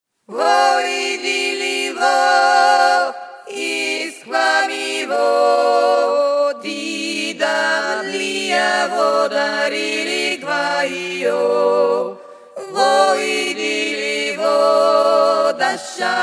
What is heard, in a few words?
lazhghvash langsamer